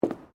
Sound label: FOOTSTEPS WOMENS LOW BOOTS BOARDWALK STEP